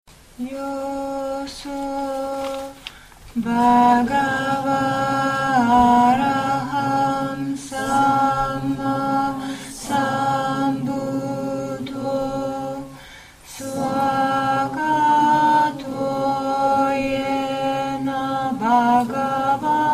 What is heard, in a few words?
Evening Puja Chant; retreat